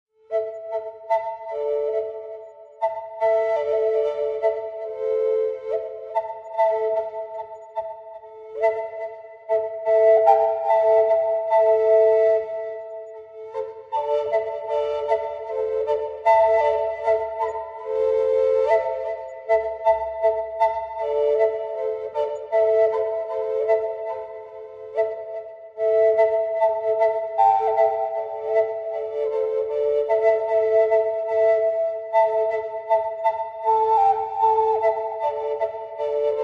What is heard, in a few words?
Double Flute's Healing